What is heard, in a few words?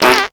fart; SoundsCrate